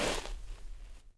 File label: Foot Snow; Civ4 warlords